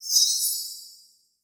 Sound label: fairy spell